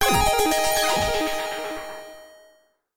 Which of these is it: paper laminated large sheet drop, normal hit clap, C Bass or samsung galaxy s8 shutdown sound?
samsung galaxy s8 shutdown sound